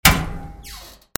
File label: HOUSE